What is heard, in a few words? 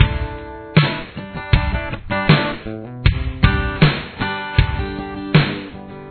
acoustic theme